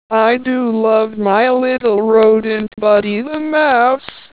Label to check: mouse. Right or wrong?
Right